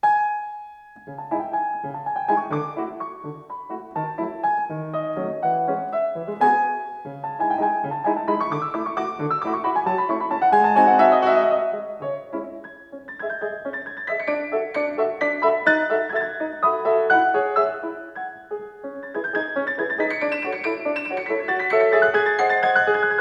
waltz

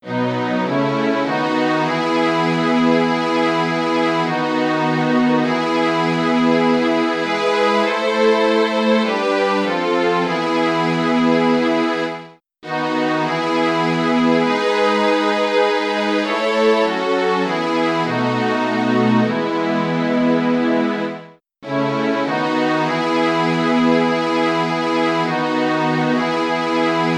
hymn, chiptunes

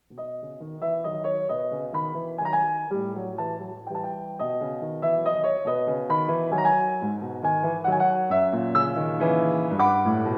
waltz

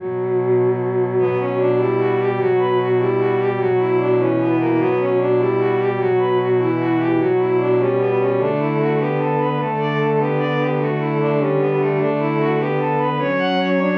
string quartet, chiptunes

